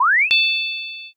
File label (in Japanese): kiraan